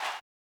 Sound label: Not Nice Chants, Vox